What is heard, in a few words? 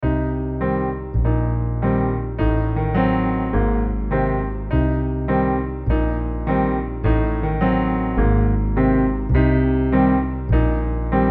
jazz no violin; JAZZ EXPERIMENT - WITHOUT VIOLIN LEAD